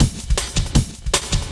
Beat, Drums

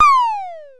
DF-500 Misc, Drumfire

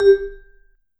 V SHRT MF G3, Marimba & Vibes; Sampleheads; Dave Samuels

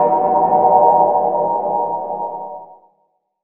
syn, Chillout Synth Atmos; Best Service ProSamples vol.36 - Chillout

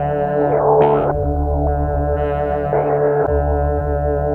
Dark drone, Pads; Sonic Foundry (Sony Creative Software); Synchro Funk

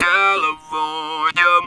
TB CALI, Talk Box

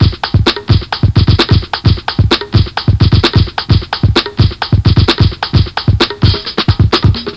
Street Beat; Sonic Foundry; Crimson Blue and Fabulous Horncraft 4 RnB; Loop Samples; NYDancePRO

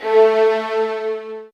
A, soft violins; Q Up Arts; Denny Jaeger Master Violin Library

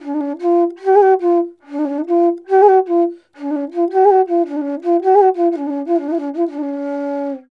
INDIA ALTO

